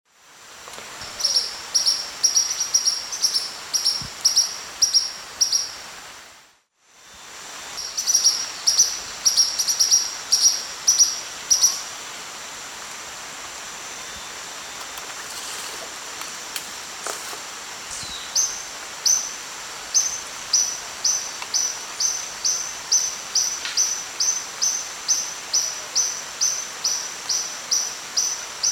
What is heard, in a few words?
Todirostrum maculatum, calls; S. America; Eastern Lowlands